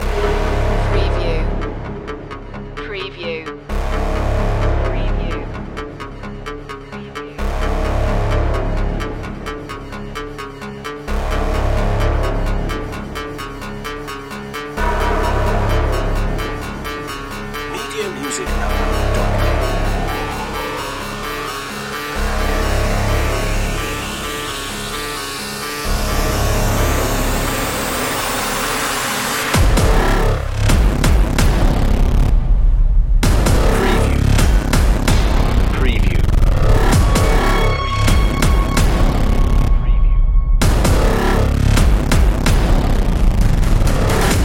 bionic animal no lead synth

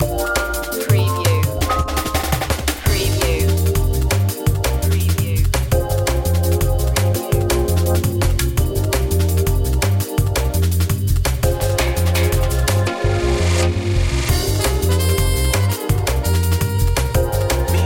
gimme jazz

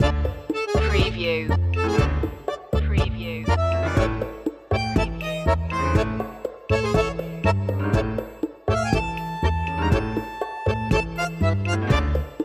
tango de luna loop

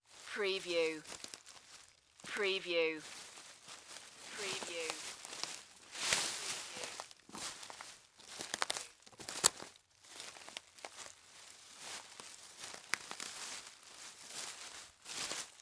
Footstep Forest Walk

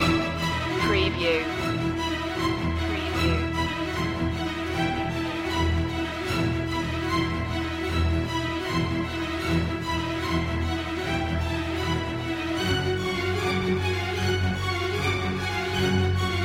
strings only

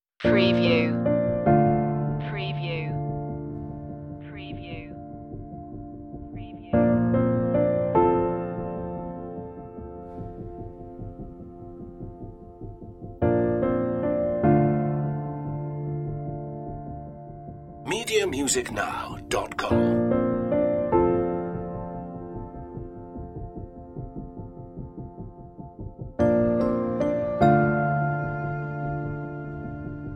no perc

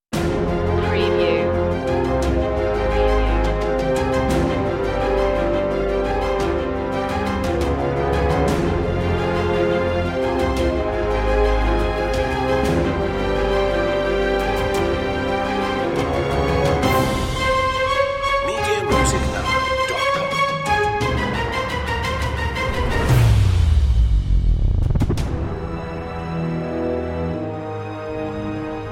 epic orchestral fantasy tension cue

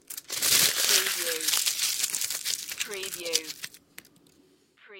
Metal foil, aluminium foil crunch

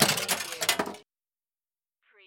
Pinball sound, ball launched, rolls and clanks

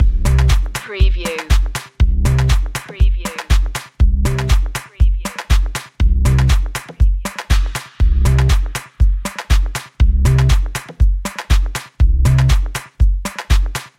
electro pulser loop

